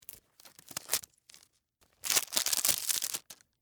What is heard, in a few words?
Cigarette Box Wrap, Foley, Cigarette, Box, Remove, Wrapper; Smoking Sound Effects; BlastWave